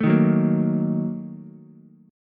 A sharp Major 7th Suspended 4th Sharp 5th, down, Ukulele; strummed; JGuitar